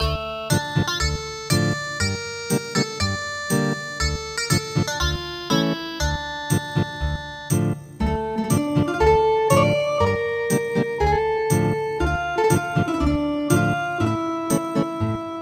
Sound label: Ethnic Guitar; DOUKINO SAKURA